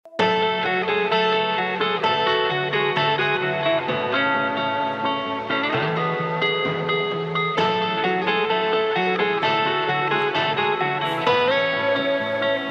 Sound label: remix gospel